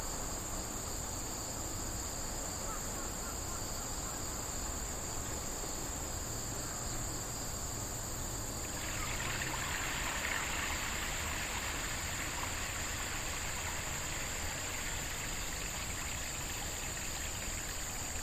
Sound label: lakeside